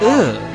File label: ugh; vega